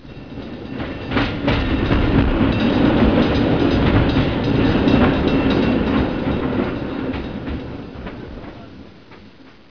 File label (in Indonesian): suara mobil